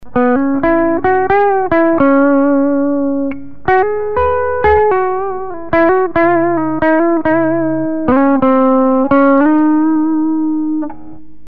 einstein line out, einstein head 100w

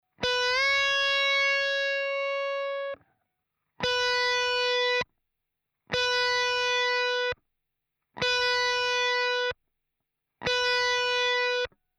micd cab noise under the note, Subharmonic Rumble; VH4

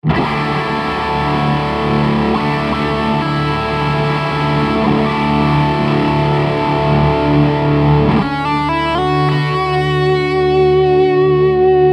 vh4 ch3 dist

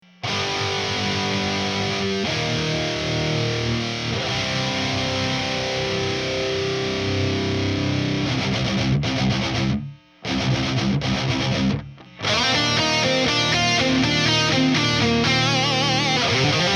amp

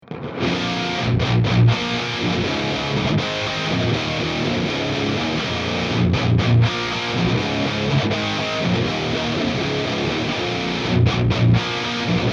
gtr; diezel for metal

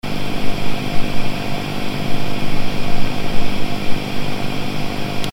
clean, Rebel30 - Hissing

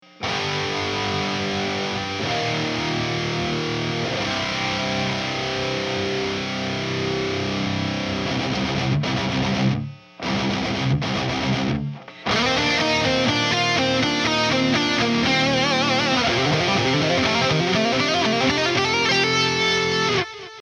amp

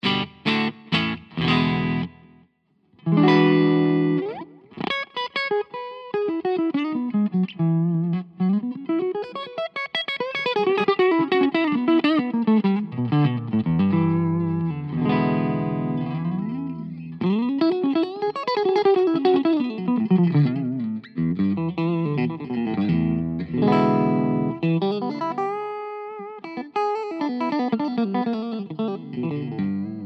strat, overdrive; coolpedals overdrive strutter

